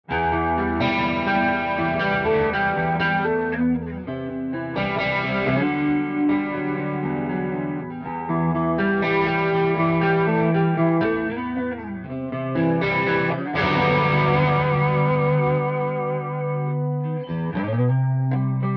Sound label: Chorus; Archetype Petrucci